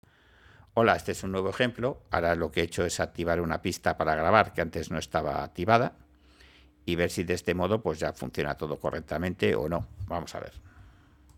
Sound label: mmmmm